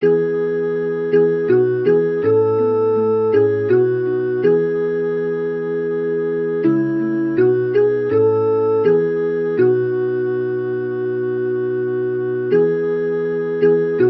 Sopran na tle SATB; Chwala Tobie

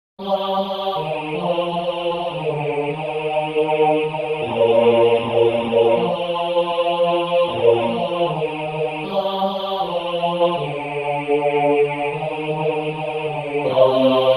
Bas solo; Skosztujcie i zobaczcie

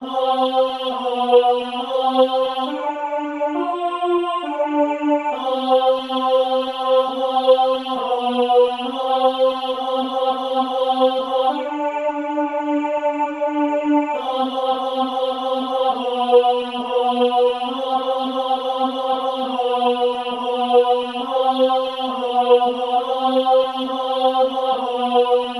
Tenor Solo; Oto sa baranki

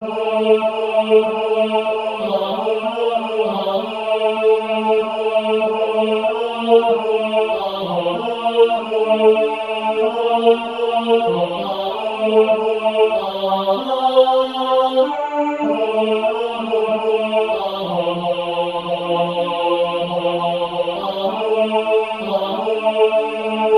Tenor na tle SATB; Chrystus Zmartwychwstan jest

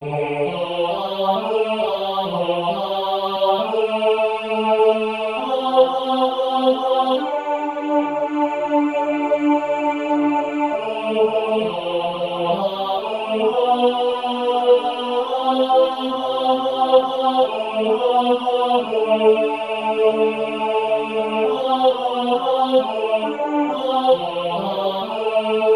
Tenor na tle SATB; Slawcie Usta Cialo Pana

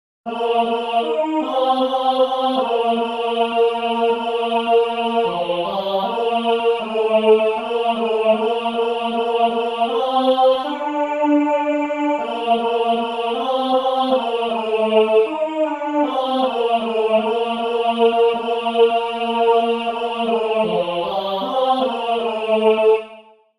Tenor solo; Skosztujcie i zobaczcie

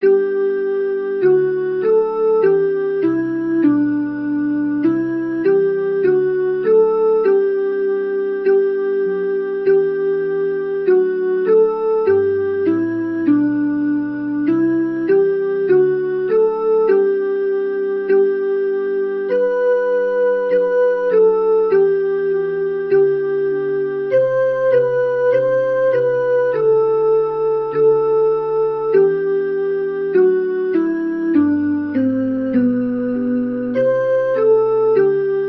Sopran na tle SATB; Zwyciezca smierci

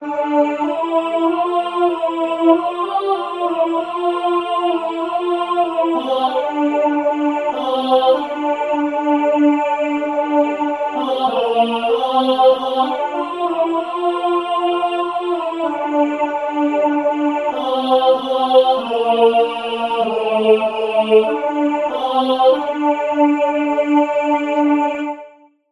Alt na tle SATB; Chrystus Zmartwychwstan jest

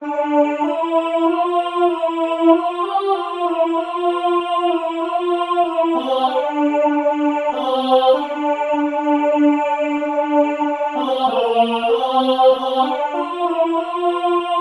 Alt Solo; Chrystus Zmartwychwstan jest

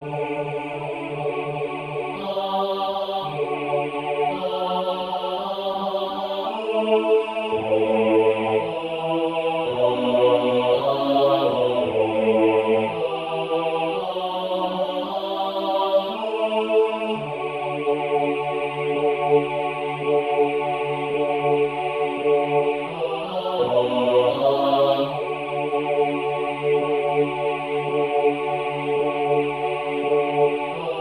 Bas na tle ATB

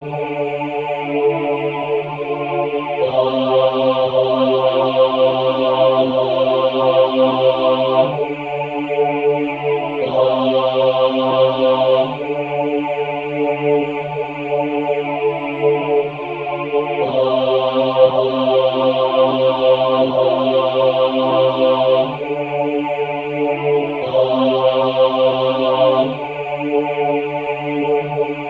Bas na tle Sopranu; Blogoslawiona

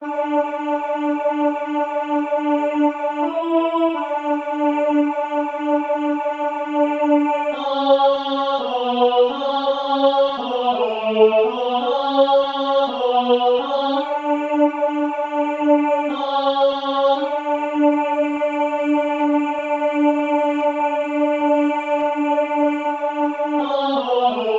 Alt solo

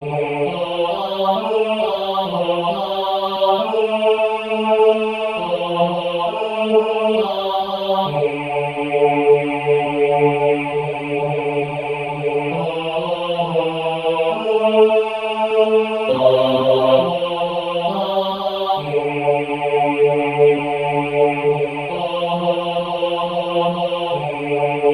Bas Solo; Slawcie Usta Cialo Pana

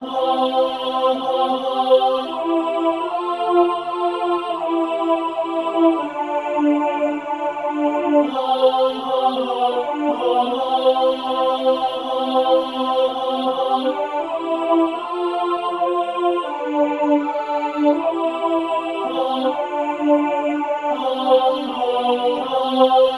Alt na tle SATB

